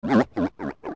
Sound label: Bubble Laugh; zelda; OOT